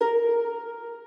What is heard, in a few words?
harp